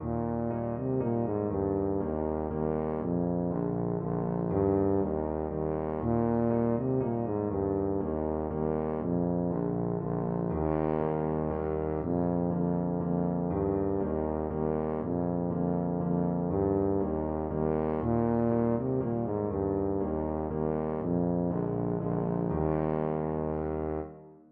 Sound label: daar word, tuba